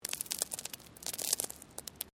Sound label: Stone Small Move; Material components for constructing more complex sounds; Rocks; Sound Effects:Materials